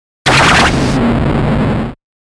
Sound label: Wash Hiss Fuzz Blip; Wipe; 5.1 STEREO MIX; Blastwave